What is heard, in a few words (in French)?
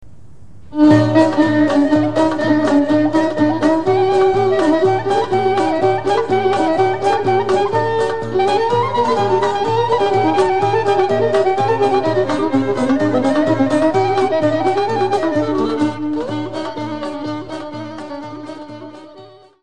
Koulouriotikos, Grece